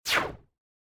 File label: missile; Mindustry